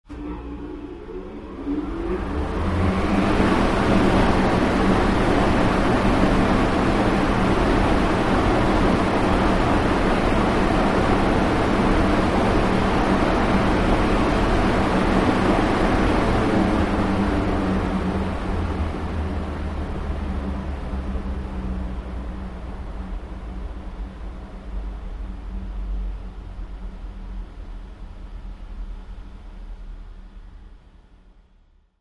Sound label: Huge Intake Fan On Ste